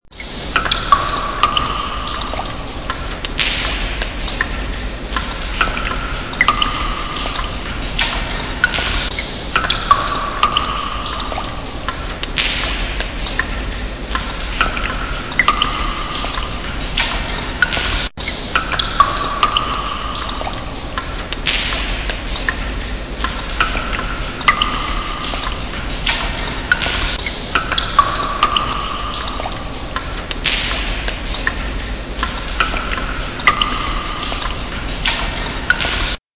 grotte

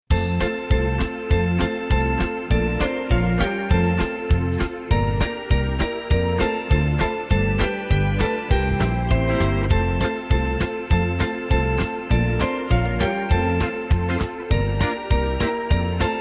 Karaoke Playback Avec Choeurs; Vive Le Vent

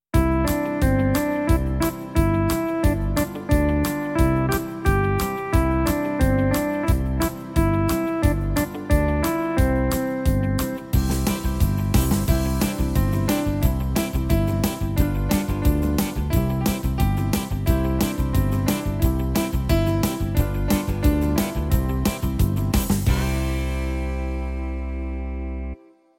Marche Cowboy

